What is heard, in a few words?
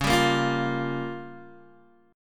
Db 6th Flat 5th, Guitar, Open G